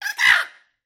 Bird White Selected; fowl

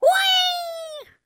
Bird White Flying; fowl

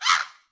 Bird White Damage; fowl

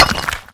Stone Damage; fowl

